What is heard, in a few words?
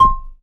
marimba C6, Ghana Marimba